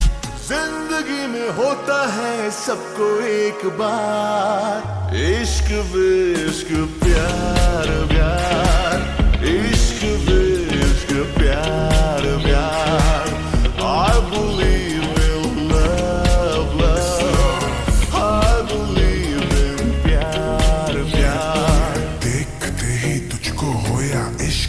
Ringtone Slowed Reverb

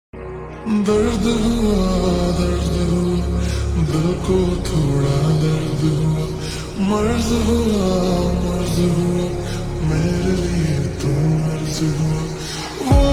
Ringtone Slowed Reverb

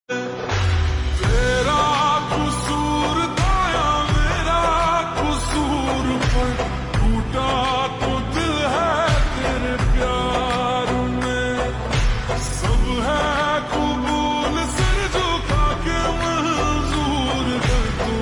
Ringtone Slowed Reverb

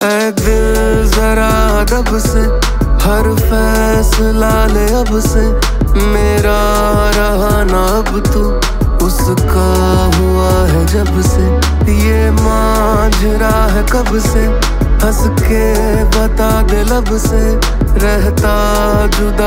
Ringtone

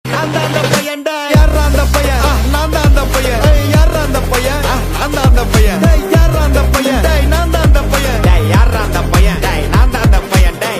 Ringtone

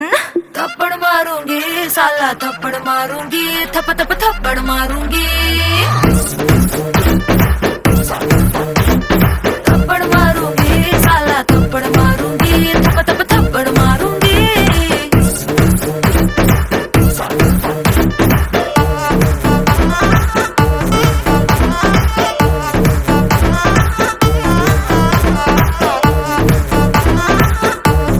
Ringtone; Allu Arjun

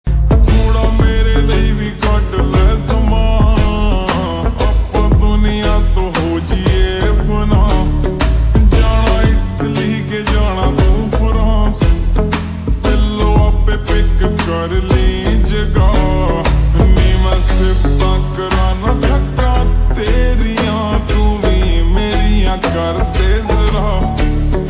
Slowed Reverb

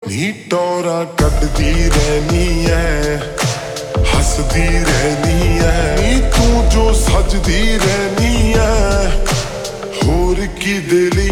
Ringtone Slowed Reverb

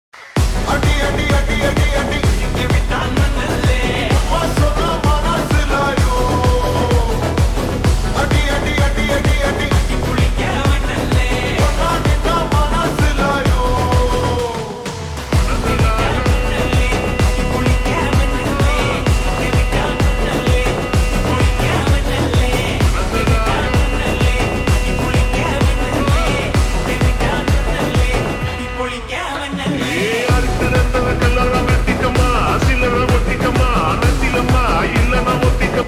Slowed Reverb; Vettaiyan

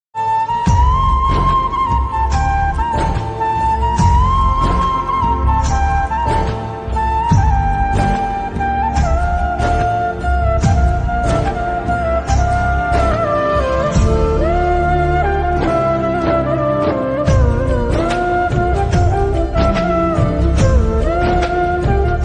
Bgm Slowed Reverb

